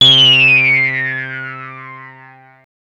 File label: Anasound Long hi C, Industrial Analog Single Notes; Sonic Foundry (Sony Creative Software)